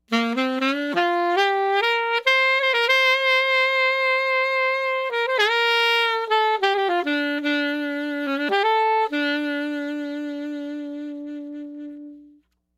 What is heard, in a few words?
alto